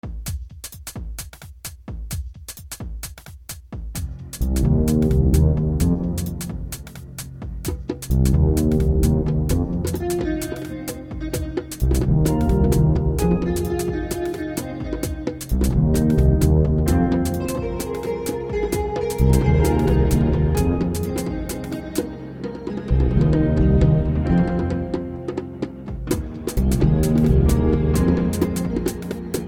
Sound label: djembe